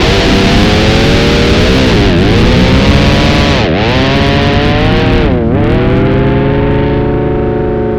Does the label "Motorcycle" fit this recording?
Yes